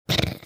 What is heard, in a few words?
snorting quietly